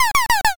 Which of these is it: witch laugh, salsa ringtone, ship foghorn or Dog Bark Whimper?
witch laugh